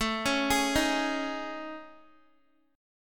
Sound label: A Major 7th Flat 5th, Guitar; AM7b5; arpeggiated; x,12,13,13,15,x